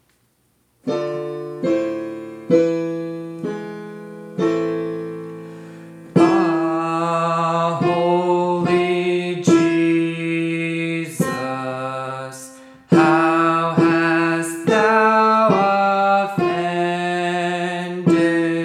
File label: Alto